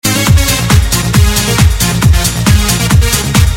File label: Loop